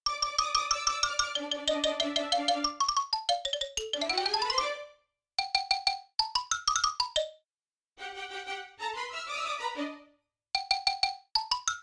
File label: melody; game builder garage 2021 switch